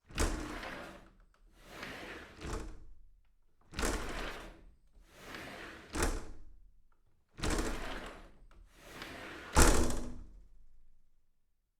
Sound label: sliding window aggressive